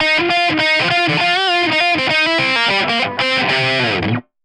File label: Guitar Solo G; соло